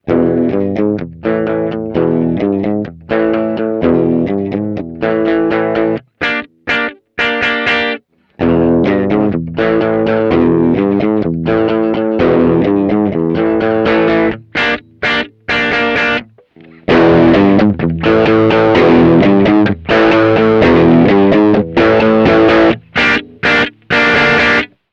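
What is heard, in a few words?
vintage clean crunch heavy; Palmer Pocket Amp MK2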